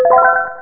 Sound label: pickup; workaholic